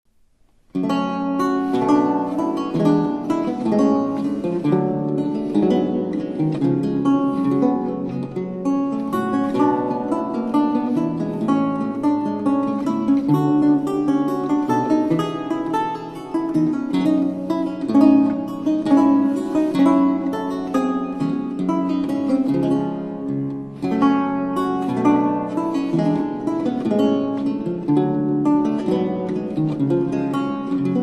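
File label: bach gavotte g minor, laute; gmoll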